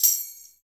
TAMB, ETHNIC PERC; Roland - LA Composer vol. 1

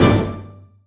HIT MAINOR

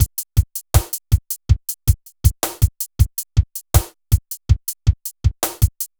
Synth Loop, BPM 80

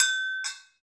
Brzl Agogo; Club-50 - Foundations Roland; Agogos; Fiji

